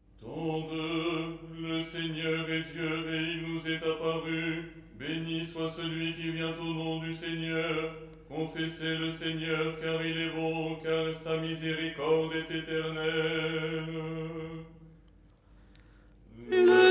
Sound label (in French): alto